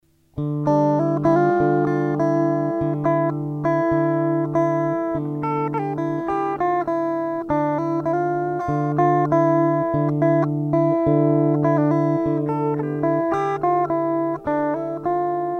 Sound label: Flange Guitar off